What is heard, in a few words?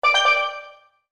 button